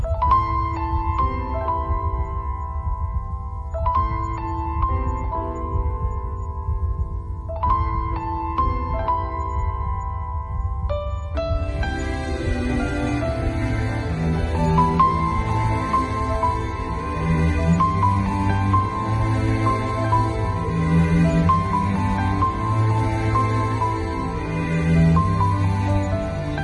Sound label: Very Sad Telugu Bgm, ringtone